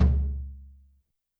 Tom Brush, YAMAHA RM1X

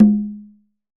Congas Tumba open; Best Service; Festa Latina VOL-1; Single-Hits

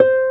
harp C4; AKAI S-Series CD-ROM Sound Library VOL-8

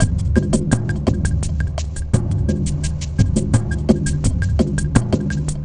SOLAR FRAME GROOVE; Sonic Foundry (Sony Creative Software); Vir Unis Infusion

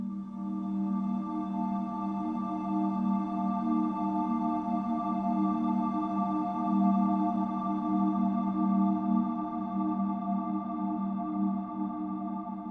in g minor; M8

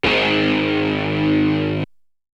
Guitar; ZERO G - Datafile DISC 1

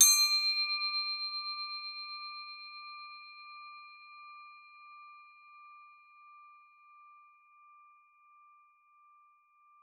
CRO D4, CROTALES; Xsample VOL-4

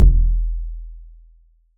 Kick; Kicks

